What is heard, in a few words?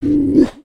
Skullken Idle; creatures organic